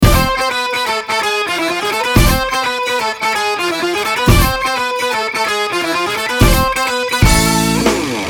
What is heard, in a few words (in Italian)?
suoneria